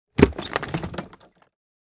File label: Impact Scrape On Wood, squeak